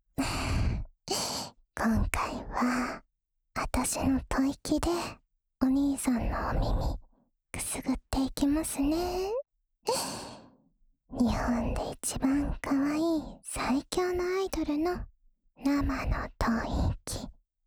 助眠用 耳朵吹气（右耳）, ASMR

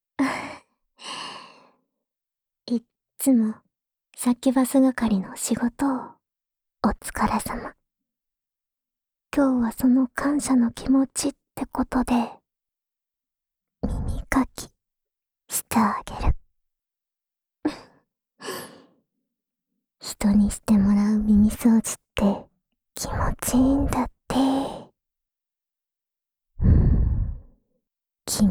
安眠用掏耳循环（左耳）, ASMR; 兔衣的安眠用掏耳循环（左耳）

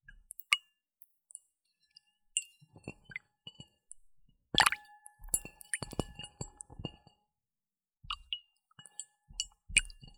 ASMR 水聲療癒

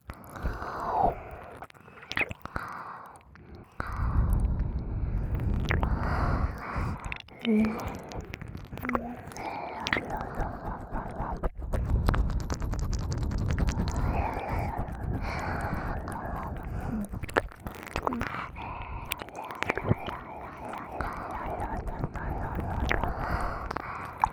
舔耳 吐息

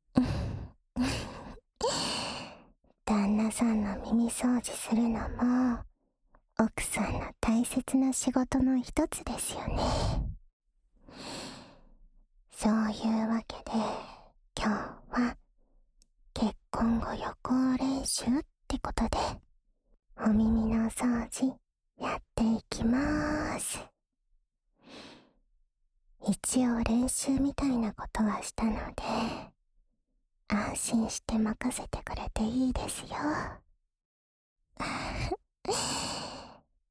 助眠用 掏耳循环（右耳）

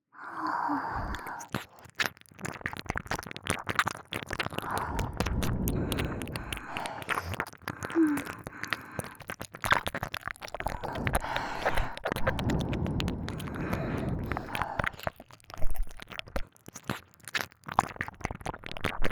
舔耳 吐息

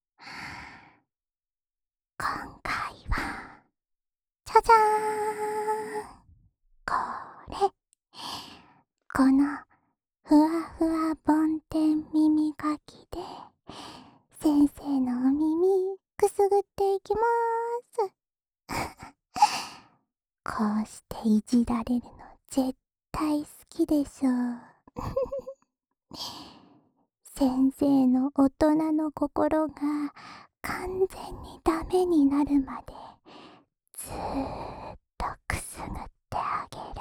安眠用 梵天掏耳循环（右耳）

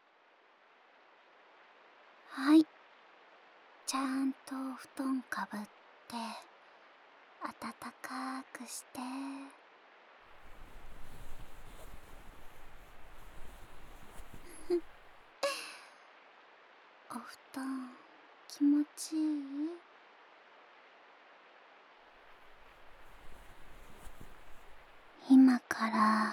被雨聲包覆著、享受安眠的音聲, ASMR

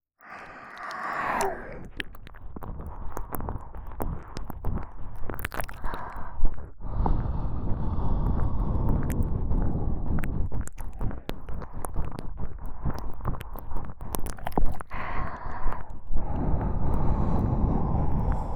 舔耳 吐息

